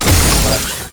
Service Door Open; Con Junction; Warframe; Entrati; Ambience

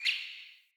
Bird Single Chirp; Paragon Bird

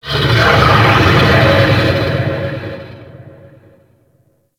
Mountain Beast Call; Warframe; Corpus

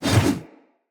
One Handed Axe Elite Whoosh Heavy; Weapons; Enemy; Axes; Sinecure One Handed Axe Elite

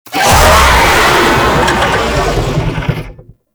Infested Oni Vocal Attack; Warframe

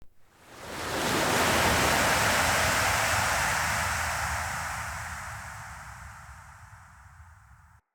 Eternium Wave Crash; Ambience; Eternium Garden

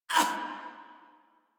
Pain Female, Battle Barks; Warframe; Modular Corpus Female

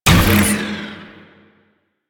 Hack Minigame Click Fail; Warframe; Duviri

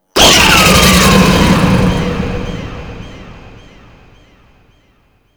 Vay Hek Frame Jump Attack Roar, Vocals; Warframe

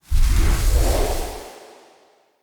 Invis Cast; Fey; Saboteur

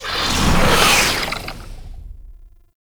Beam Start; Warframe; Entrati; Conquest; Vampyric Liminus

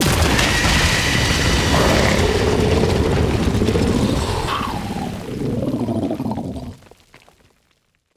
Limb Spawn Stinger; Warframe; Entrati Labs

